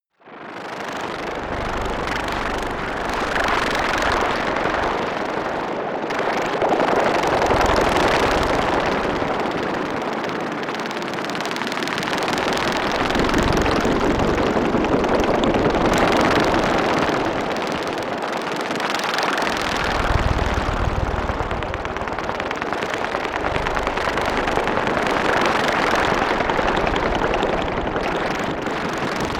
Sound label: atmosphere helicopter; atmosphaeren